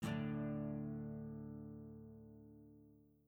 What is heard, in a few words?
wand Out